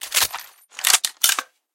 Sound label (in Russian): shustraya perezaryadka umelimi rukami